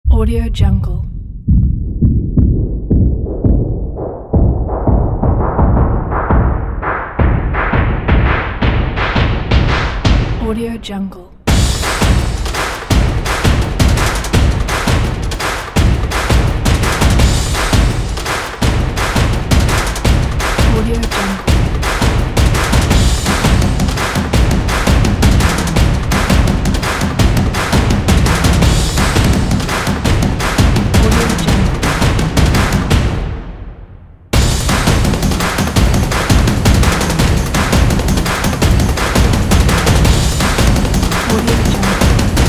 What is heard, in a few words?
stomps and claps